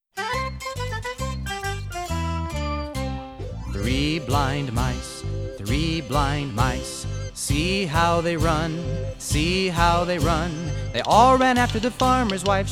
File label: Tune Three Blind Mice